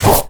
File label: ravenous basic attack; LoL; yorick